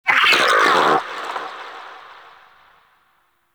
Vocal Death, Shrimp Lantern, Creatures; Baliq

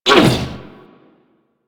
Struggle; Warframe; Amalgam Override; Abilities

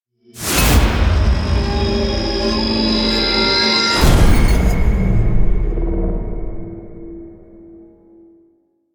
First Death Stinger; Enemies; Finishers; Warframe; Duviri; Dax

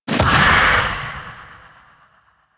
Fairy Soul Impact; DE; Warframe

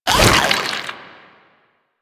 Proto Infested Runner Jump Whoosh; Warframe; Abilities

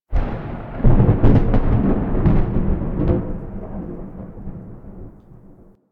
Landscape Thunder Crack, Ambience

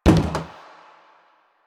Plastic Hit Short; Warframe; Nineteen Ninety Nine

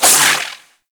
Void Projectile; Duviri; Incarnon Adapters

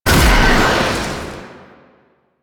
Void Launch, Weapons; Duviri; Incarnon Adapters

